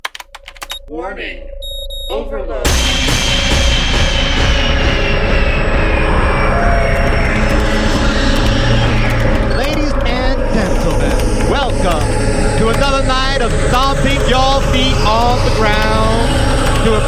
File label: pads; Muzik 4 Machines; Intro du show